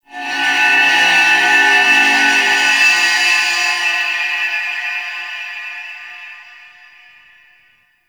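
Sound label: Shipyards Distant Metal Bend, Ambience; Warframe; Grineer Shipyards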